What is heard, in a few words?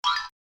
Y type Impulse